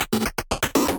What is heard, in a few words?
glitch loop; machine tribes